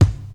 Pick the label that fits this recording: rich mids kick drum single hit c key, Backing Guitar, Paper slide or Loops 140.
rich mids kick drum single hit c key